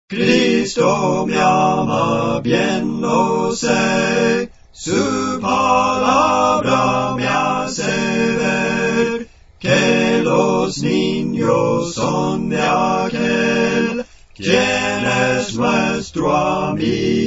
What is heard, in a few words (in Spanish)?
4 Voces, Cantos Espirituales; Las cuatro voces; Clase del Canto